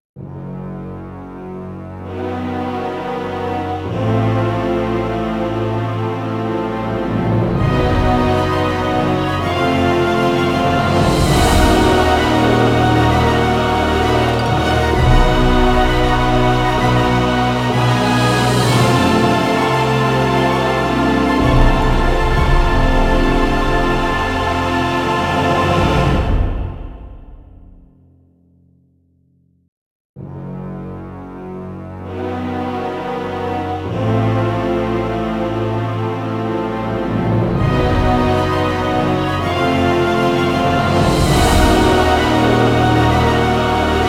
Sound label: epic